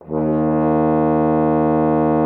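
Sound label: French horn D#3; Xsample VOL-3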